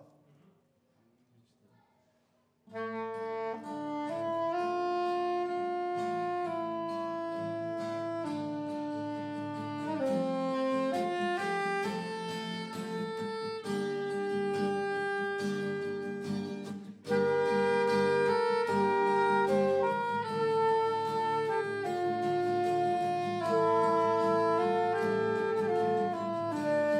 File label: Instrumental